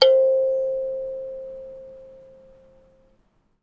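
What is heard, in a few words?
KALIMBA D2; Synclavier Percussion; World & Orchestral; ILIO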